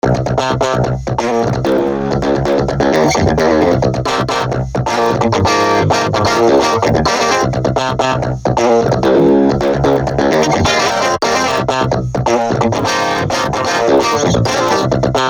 gtr wit comp

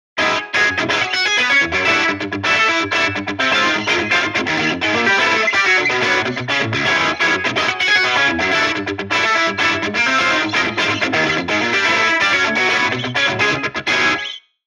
Whack

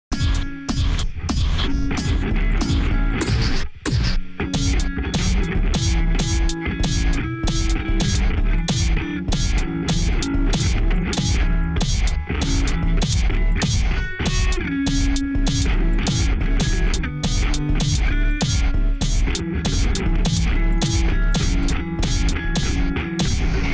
gtr

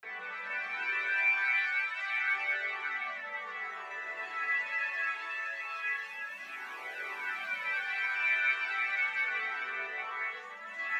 pad

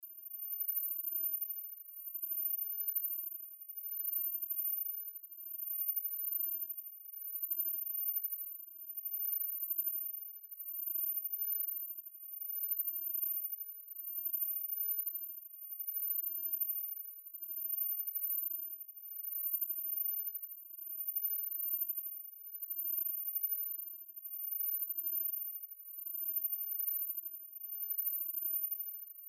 piercer loop

